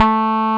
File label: Roxichord, E.Piano